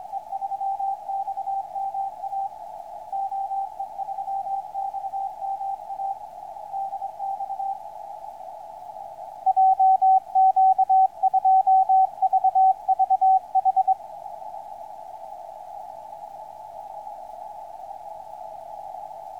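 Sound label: 160m, signals on 160m band; OE3XMA; RAØFF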